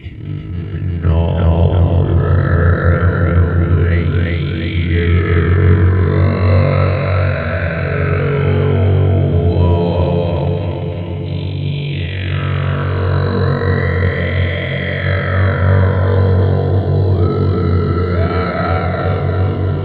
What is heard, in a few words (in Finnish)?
kurkkulaulu